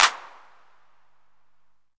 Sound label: big room clap single hit D key; percussion; single hits one shots